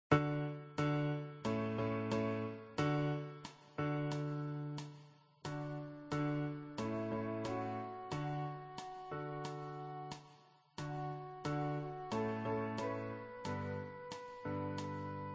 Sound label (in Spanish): Flauta